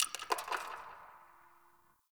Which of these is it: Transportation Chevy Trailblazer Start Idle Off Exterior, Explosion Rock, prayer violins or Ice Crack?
Ice Crack